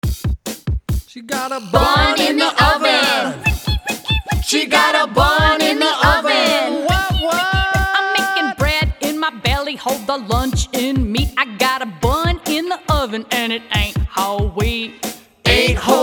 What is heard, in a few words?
vocals